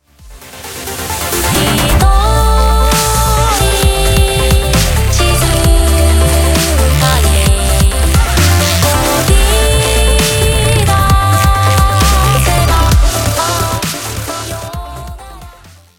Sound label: BGM xfade; Invaxion